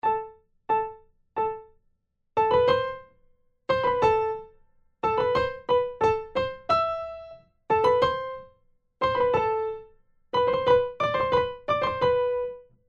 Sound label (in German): Primo, Vierhaendige Uebungsstuecke; Playbacks; Diabelli